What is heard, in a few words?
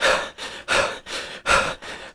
BREATH